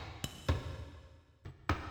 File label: bottle, ethno, 125